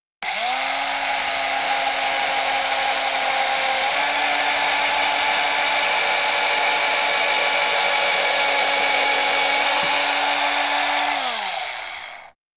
Drill

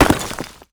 rock fall

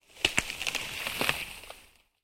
small tree falling

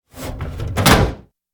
metal drawer close

